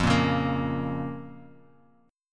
F Suspended 4th Sharp 5th F Bass Standard; strummed; 13,13,11,15; JGuitar

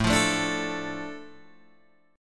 A Suspended 4th Sharp 5th, Guitar Open G

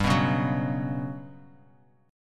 G Major 7th Flat 5th, Bass Standard; strummed; JGuitar

